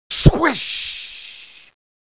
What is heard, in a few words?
SWISH